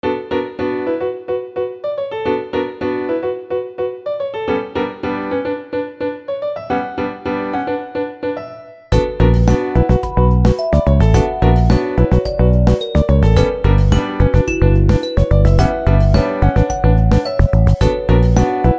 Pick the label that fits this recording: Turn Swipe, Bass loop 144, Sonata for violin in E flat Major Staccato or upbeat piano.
upbeat piano